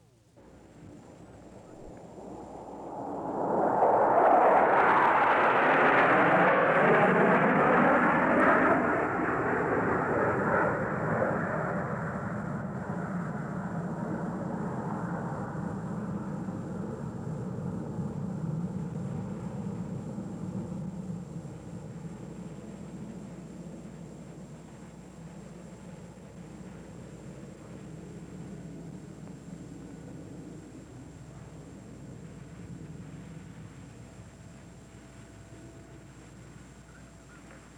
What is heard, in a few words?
Military Jet Fly By